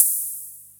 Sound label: open hihat; Techno Trance Essentials; DRUMS